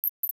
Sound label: Nyctalus leisleri; europe